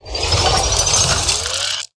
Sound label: Tentacle Play